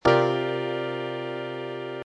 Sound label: C7 sus4